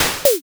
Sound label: fish jump